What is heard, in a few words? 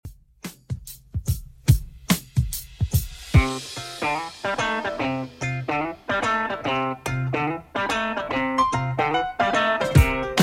70s Redrum 146bpm